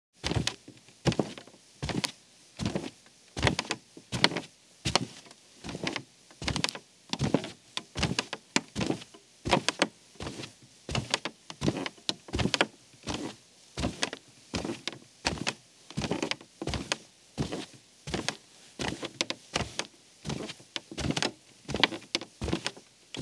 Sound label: Footsteps, Foley; Sound Ideas 6016